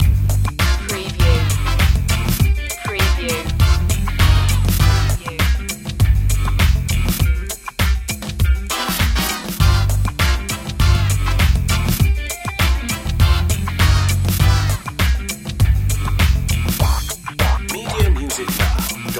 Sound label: loop, funky groovy